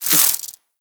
Spike Proj Whiz; Enemies; Warframe; Infested Ashen

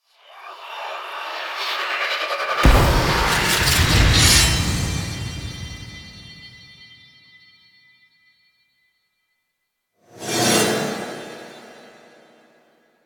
SFX Spawn; Stalker; Warframe; Orbiter Nursery

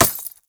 Bullet Impact Glass Large; Weapons

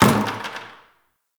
Destructible Bucket Impact; Warframe; Ambience; Nineteen Ninety Nine

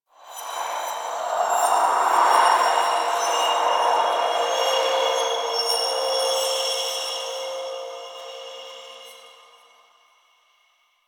Interior Cave Spirit Gust; Ambience; Duviri; Warframe

